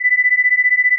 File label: 2000 Hz plus 1985 Hz 15 beats per second